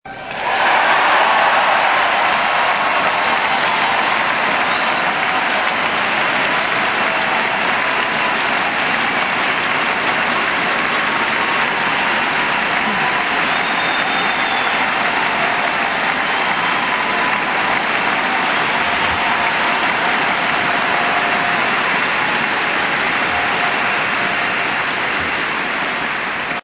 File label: applause